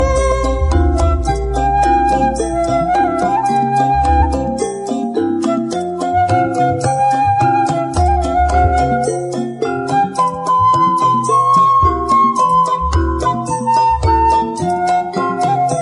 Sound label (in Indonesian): musik klasik nada dering; indonesia